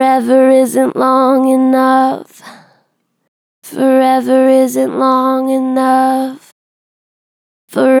Gen Vib Forever isnt long enough, Vocals, Natural Vibrato Gentle; Harmor Vocals